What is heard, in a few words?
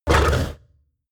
lioness roar short